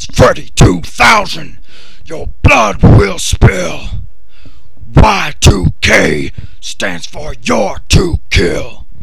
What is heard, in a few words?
chorus